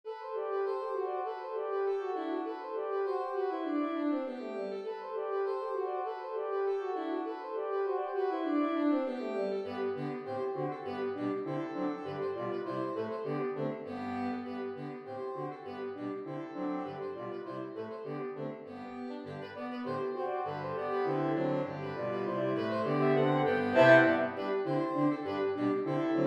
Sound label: Sax; Grainger; Shepherds Hey